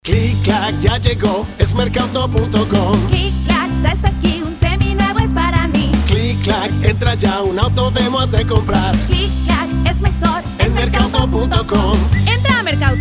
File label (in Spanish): Jingle; Merkauto; ClickClack